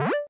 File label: shield Up; SpaceShooter; Space Shooter Redux